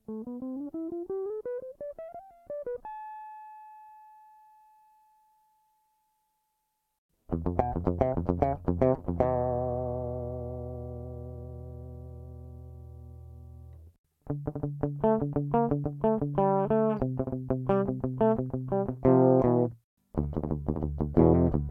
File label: passive guitar